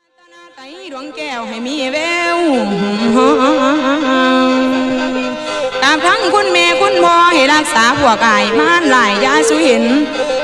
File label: luk thung jazz molam, thai